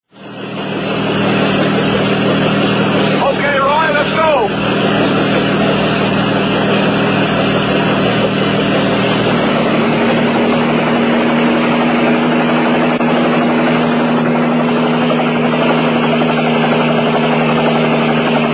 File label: copter